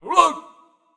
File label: attack vocals